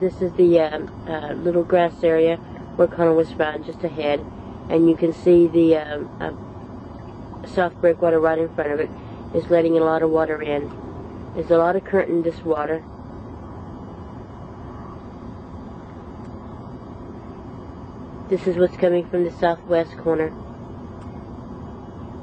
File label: jetty